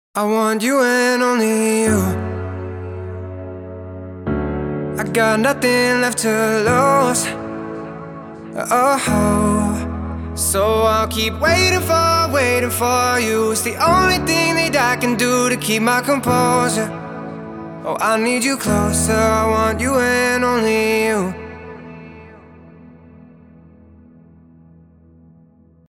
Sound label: main piano